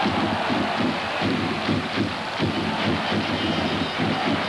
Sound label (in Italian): tamburi; romadundee; Rullìo di tamburi # 2